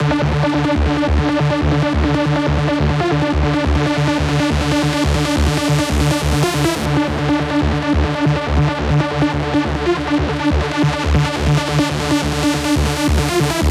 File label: Synthetic Lead Dm 140BPM, Techno; Rave; Melody Kits; Stems; Ghosthack; 7 Free Rave Melody Kits 2025